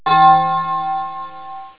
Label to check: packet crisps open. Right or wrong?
Wrong